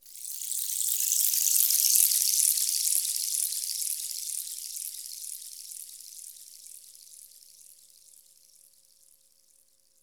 WATER, ATMOSPHERICS; Music Store - Claudius Diemeter Schwerlos

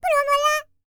C ASIA, World Voices

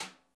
Chuck Brush, drums; PX7; Digital Sound Factory Protean Drums

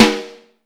Snare Drum, 5X14; Q Up Arts - Johnny.C's Vintage Snare Drum

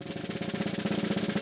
roll, Steve Gadd Drumscores

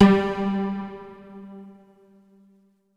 PIZZ G3, SYNTHSTRINGS; Music Store - Claudius Diemeter Schwerlos

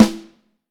Snare Drum; Q Up Arts; Johnny.C's Vintage Snare Drum; 7.5X14

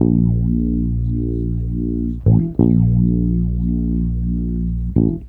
Bass Loop; Basses; Sonic Foundry; Ambient Grooves

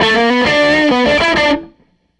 SG Guitar Loop; Sonic Foundry; Six-String Orchestra

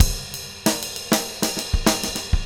LOOP, drum; 90s sample CD

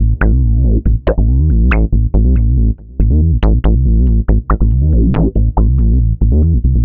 Synth Bass

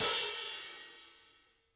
HTS OP L, Drum Kits

